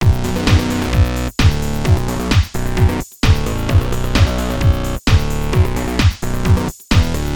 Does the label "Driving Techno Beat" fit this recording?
Yes